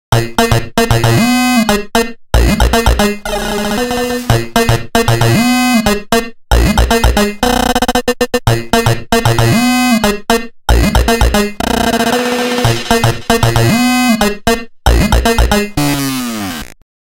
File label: Bit Crush; Nokia; Nokia Tones; Windows Phone apps